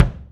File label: kick, 3D Drum Sprint; E-MU Producer Series Vol. 5 – 3-D Audio Collection; 3DSprints